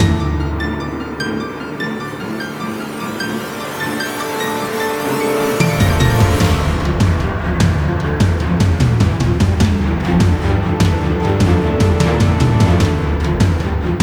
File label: Action Trailer Music Cue